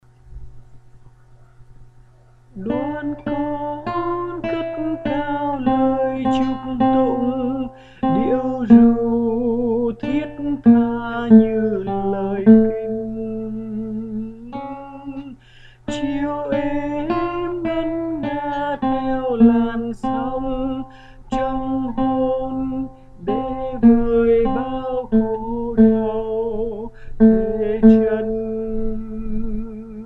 tenor

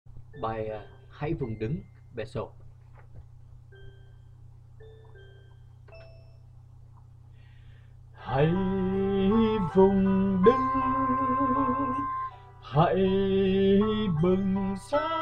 Soprano

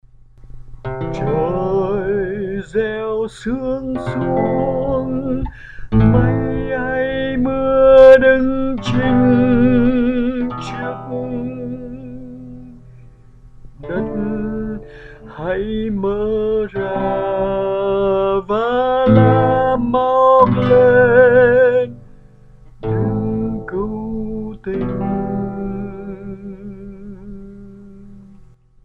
Tenor

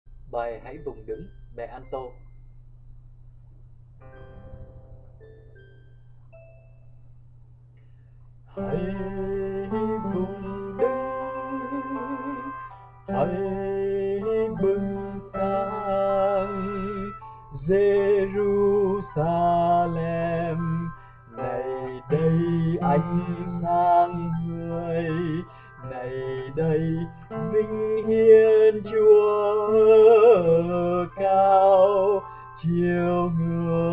Alto

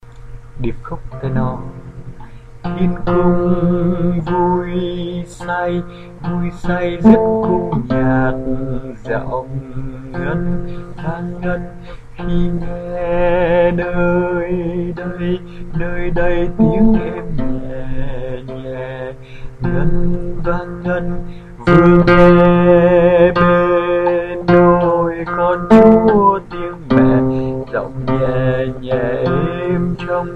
Tenor

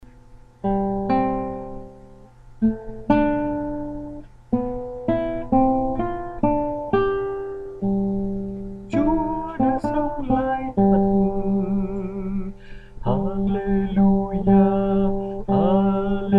Bass

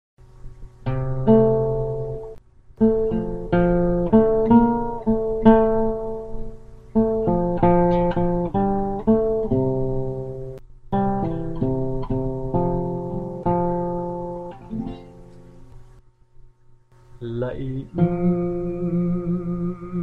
Bass

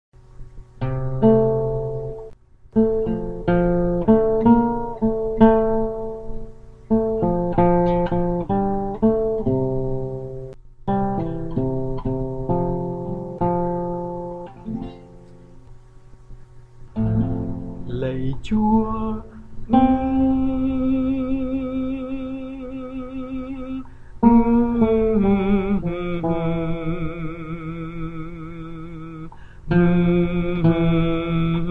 Tenor

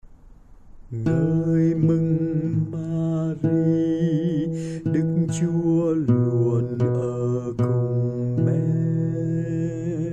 Bass

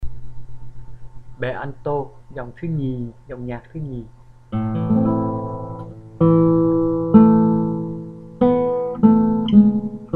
alto

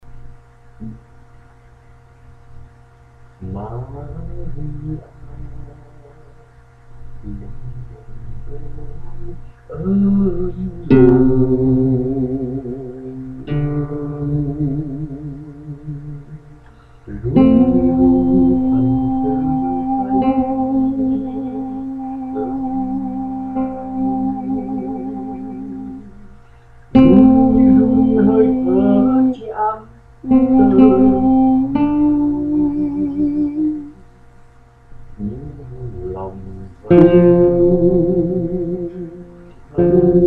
Solo

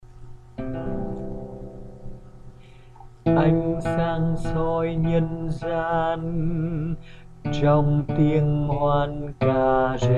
Alto

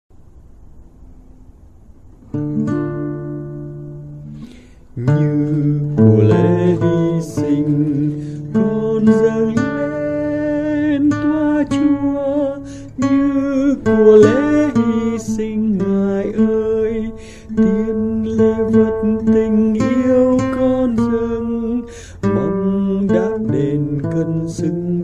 Tenor

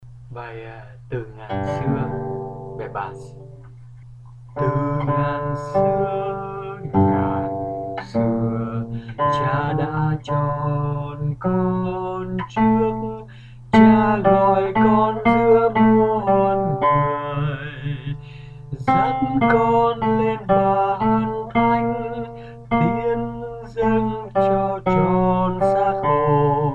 Basso

